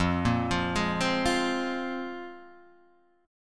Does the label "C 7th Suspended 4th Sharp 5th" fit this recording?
Yes